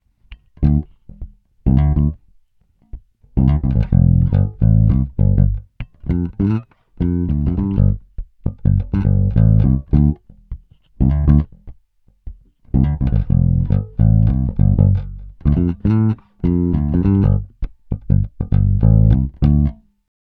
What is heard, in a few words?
Humbucker Fingerstyle Tone UP; peavey shootout audio samples